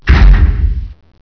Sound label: door stop; gearbox